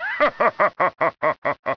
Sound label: hahaha